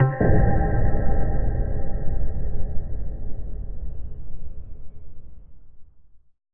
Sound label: Impact Boom Ping; bottlerocket